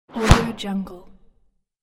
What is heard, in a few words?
swooshy punch slap